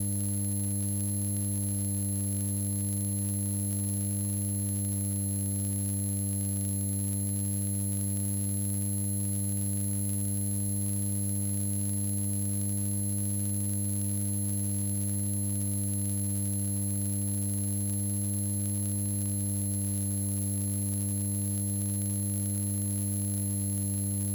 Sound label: Signal Interference; Glitchedtones